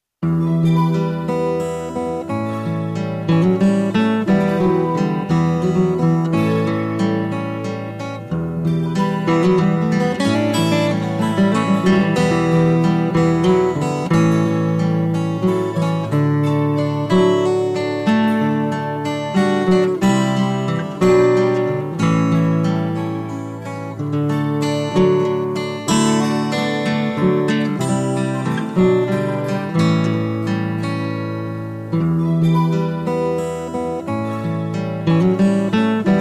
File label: Loop